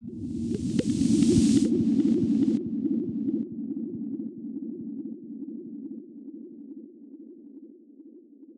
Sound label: fx bubbles